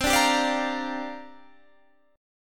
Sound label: C 7th Suspended 2nd Suspended 4th C down Guitar Standard; C7sus2sus4; strummed; capo=2